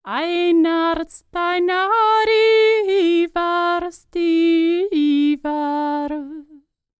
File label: Nord, World Voices; ILIO; Vocal Planet VOL-5